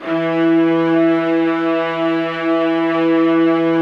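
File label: violins, low violins; Q Up Arts - Denny Jaeger Master Violin Library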